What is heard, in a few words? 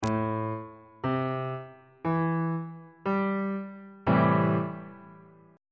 c major 6th 3rd inversion on bass clef